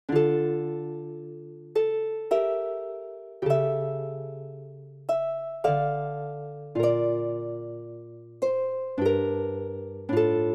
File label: chaconne, harp